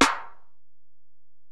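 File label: Acoustic Snare, Alesis DM-PRO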